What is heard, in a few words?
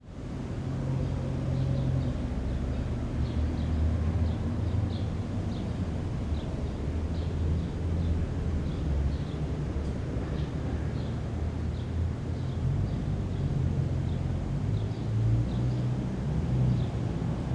Urban Ambience Birds Wind Leaves Cars